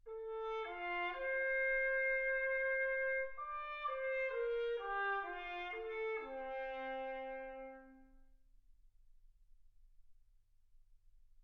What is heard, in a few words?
trumpet